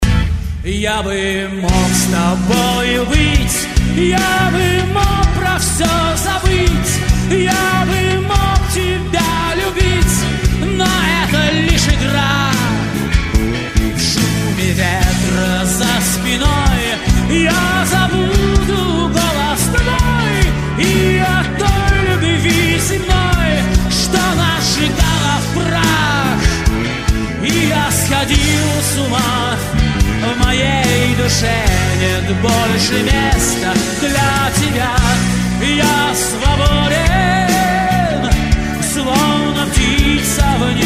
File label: Ringtone